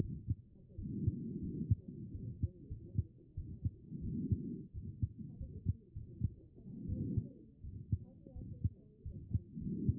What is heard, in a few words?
HEART pos1 B MODE